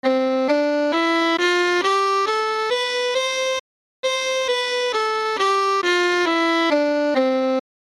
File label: Violin